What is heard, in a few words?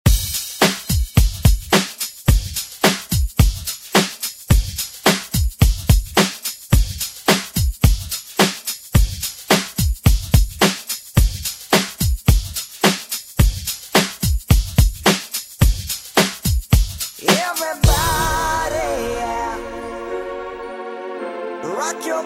Straight Kutz; DJ Mhark